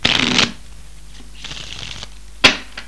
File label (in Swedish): Blanda; Solitaire House